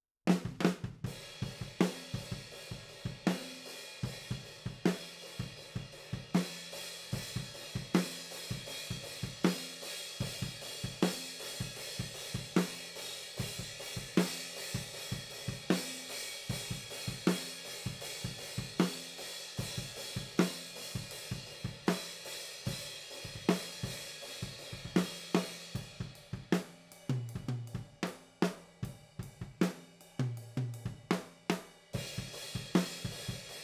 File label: OH A